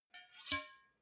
two knives large scrape short

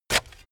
shovel stab

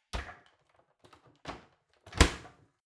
oven close fumble

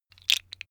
tiles wood

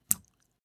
step barefoot wet

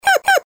creature quack funny

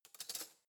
cutlery spoon drawer grab soft

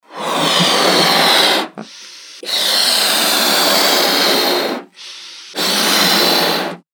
balloon inflate

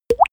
glug

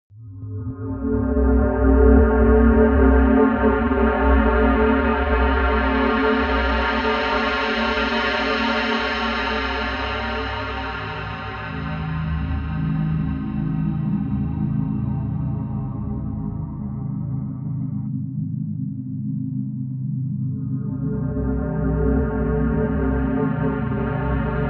drone soulless cave

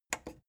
plastic button small simple click slow

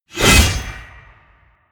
sickle hit

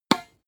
tennis ball hit

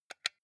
console controller xbox onoff press fast

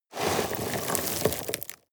sweep small rocks

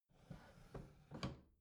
bathroom drawer close soft

